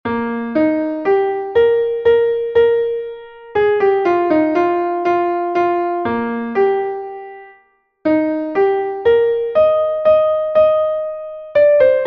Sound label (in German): klavier; MelodieMeister